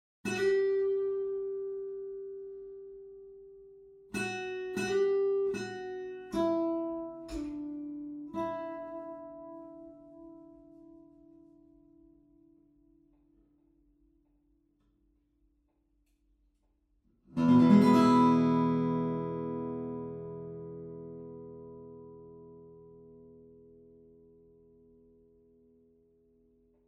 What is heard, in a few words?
nashville guitar; dark horse record.